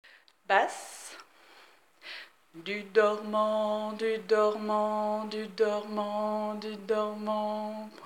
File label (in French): Dormant basse Refrain